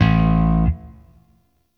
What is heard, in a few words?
Shawzin; Warframe; Sentient Shawzin